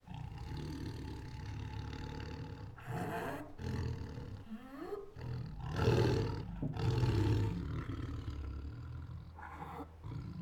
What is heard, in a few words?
Siberian Tiger Aggressively Growls, Roars and Snarls; Tigers; Sound Ideas